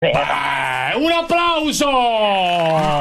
un applausooooo; cruciani

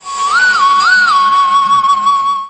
fischio

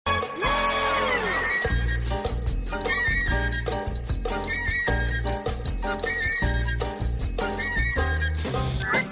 jingle pubblicita

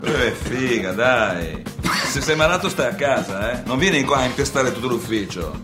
tosse, milanese malato; limbruttito

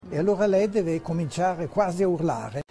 cominciare ad urlare

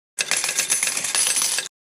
suono effetto soldi

